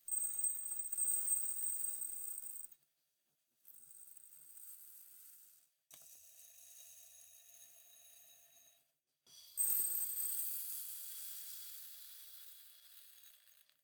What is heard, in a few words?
Sand Or Seeds Falling A Jar